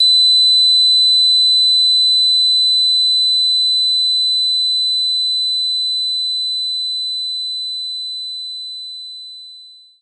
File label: Siku C6; knights try